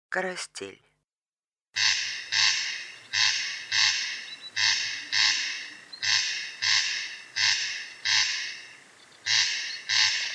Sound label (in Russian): Golosa ptic Korostel